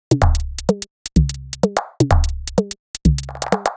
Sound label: Futher Top B 127bpm, House Drumloops; 90 s Sample CDs; Zero-G House Fabrik Drumloops; ZG Drumloops